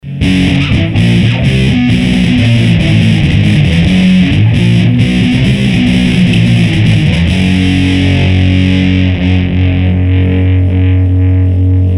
Metallic-A DI; Sony HR-GP5

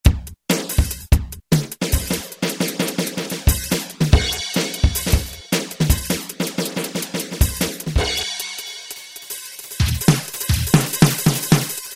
Double Drm, Roland U-110; Factory Patch

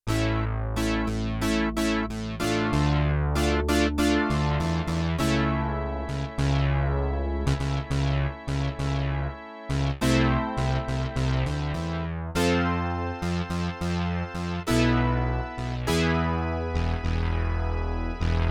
Polysynth, Yamaha TX16W; Synth Set

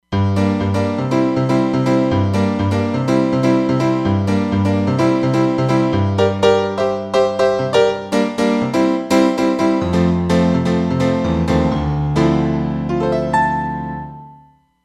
JD-800 Piano, Roland Fantom XR

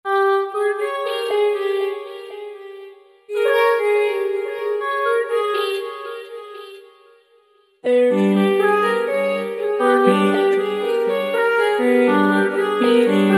Roland V-Synth; MysticCircle; Factory Patches

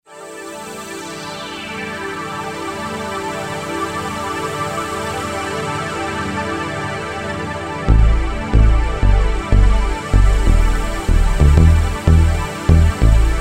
New Age Split, Roland SC-880; Factory Patches

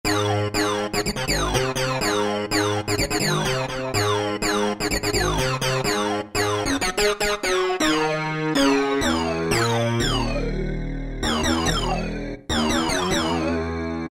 Sync Hard, Vintage Synthesizers; Vintage Synthesizers And Classic Beatboxes

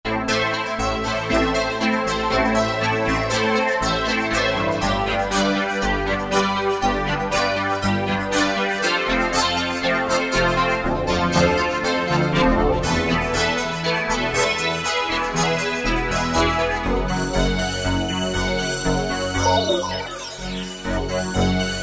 Roland V-Synth; Synthetik; Factory Patches

